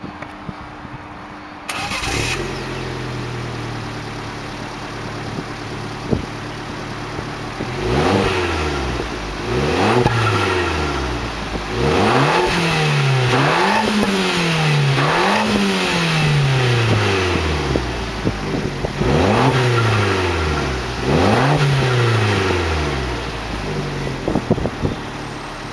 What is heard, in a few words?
ABARTH PUNTO, tuneup